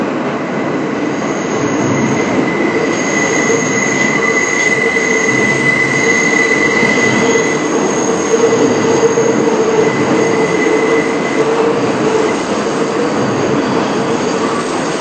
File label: Flange, train; OpenBVE